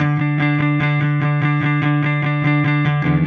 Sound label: Silos Clean Strum Guitar, Indie Rock